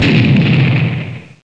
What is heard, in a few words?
fireball strike